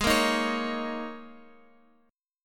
Ab Suspended 2nd Suspended 4th Ab down Guitar Open F; strummed; Absus2sus4